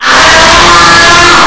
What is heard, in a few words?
Scream